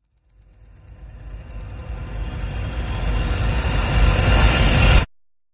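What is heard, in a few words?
reverse sound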